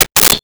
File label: Staple Gun into Wood; Tools; 1001 Sound Effects